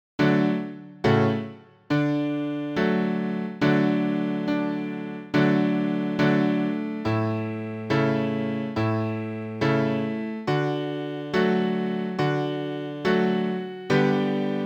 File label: D SCALE for Strings